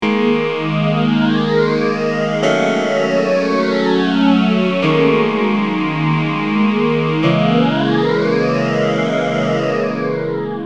m1000 phase

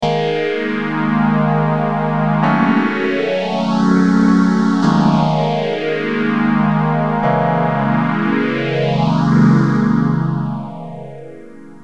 phasor; dod

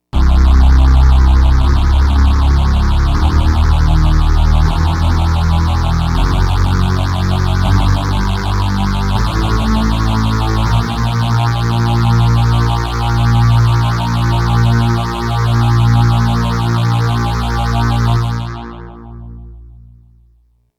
octa scale tcxii re

